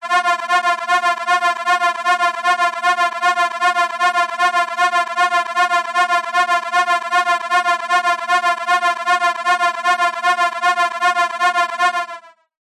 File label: science fiction alarm siren warning weird; zapsplat